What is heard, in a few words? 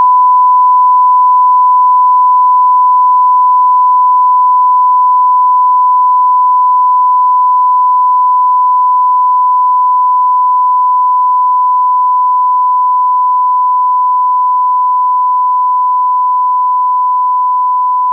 Sweep 3 to 140 dB